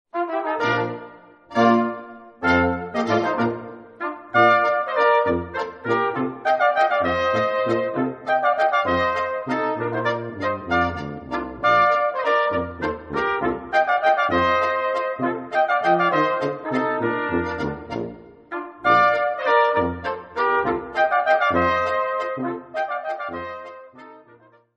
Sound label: Landler